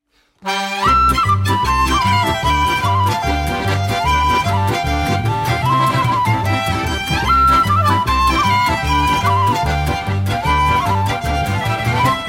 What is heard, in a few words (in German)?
Polka